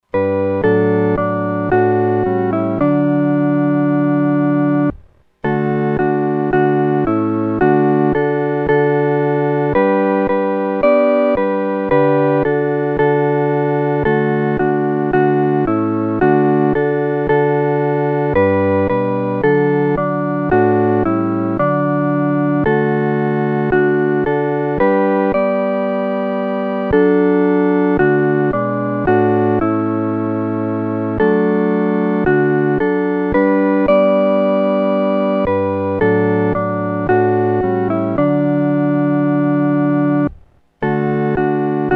合奏 四声部

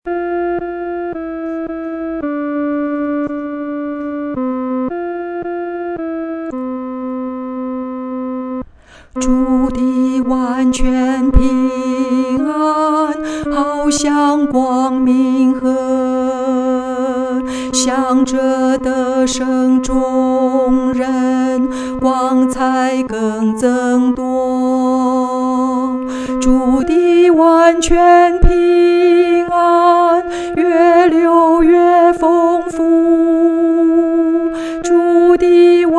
独唱 第二声; 完全平安

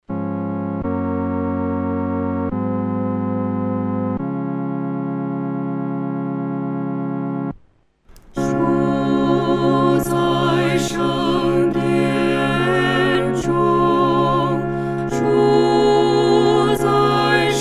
合唱 四声部

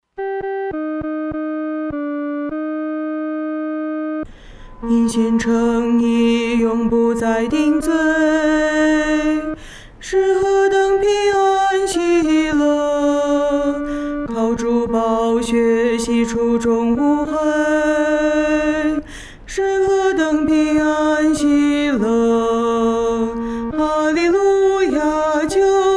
独唱 第二声

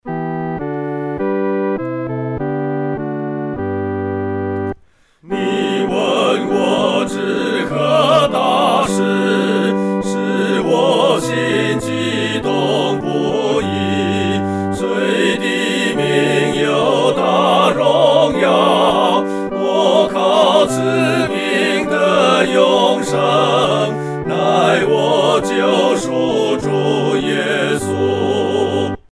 合唱 四声部

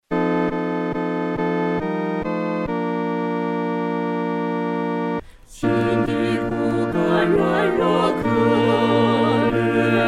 独唱 第二声

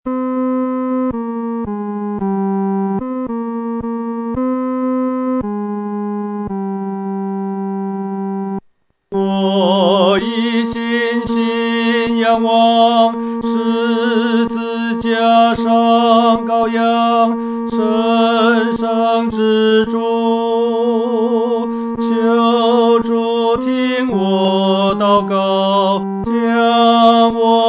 独唱 第三声

